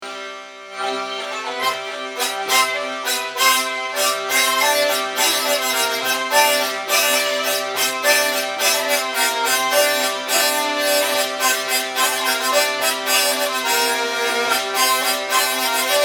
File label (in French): Bourrée; Bourrées 3 tps